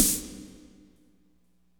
Snare; Drums & Percussion; One Shots Drums

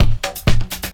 Dance Drumloop

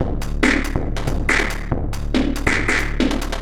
Electronica Drumloop

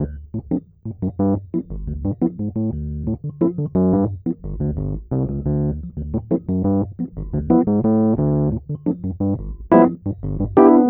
E. Piano

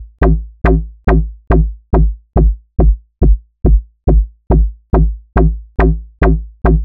Synth Bass

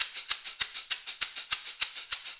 Shaker, acoustic percussion loop; Drums & Percussion; Percussion Loops

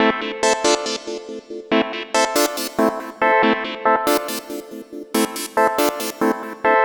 Synth

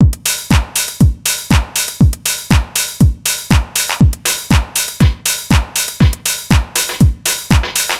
Dance Drumloop

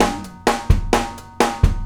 Acoustic Drumloop

